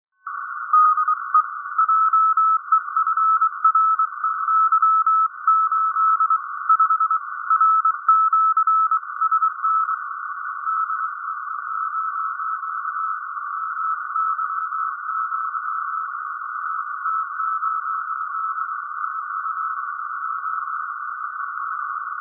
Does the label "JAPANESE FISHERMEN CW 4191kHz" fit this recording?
Yes